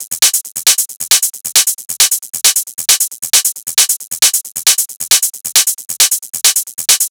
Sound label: Hat Loop 135, Techno; Ghosthack; Techno Sounds 2025